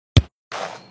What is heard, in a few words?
Kick into net; Nokia; 8600 Luna